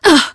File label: Wound; Sif